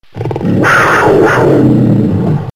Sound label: American Wildcat; wild animals